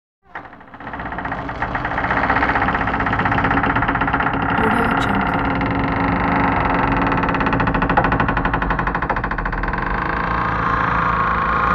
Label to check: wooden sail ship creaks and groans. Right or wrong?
Right